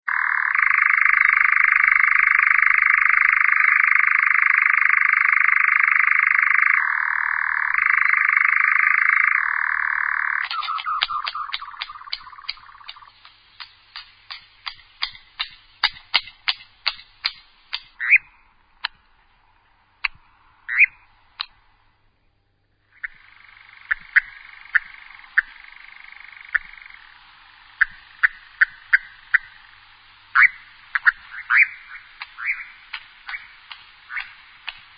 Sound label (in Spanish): canto chotacabras